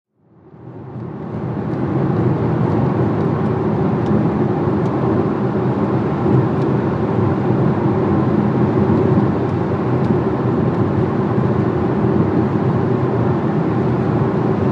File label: Sedan Accl 00 Mph Slo